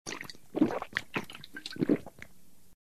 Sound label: beer drink; Original TK Sounds